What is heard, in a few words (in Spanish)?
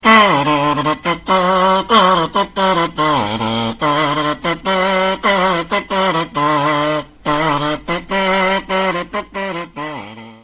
mirliton